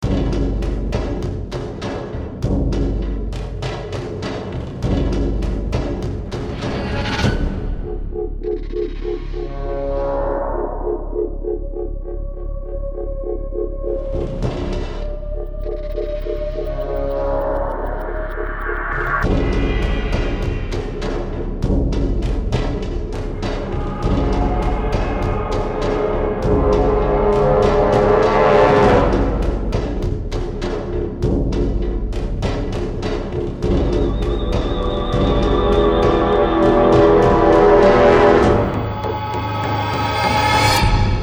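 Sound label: Power Armor Loop; F.E.A.R.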